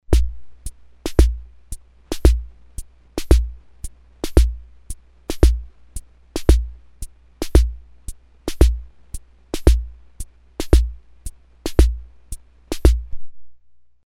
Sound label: TANGO, Ace Tone Rhythm Ace FR-2L